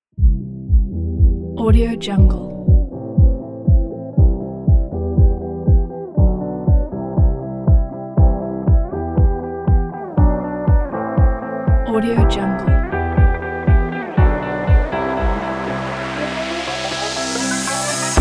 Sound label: experimental electronic pack